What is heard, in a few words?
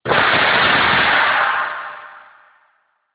metal fall, industrial